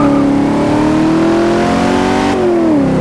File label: second, vehicles; gmod; tdmcars; f100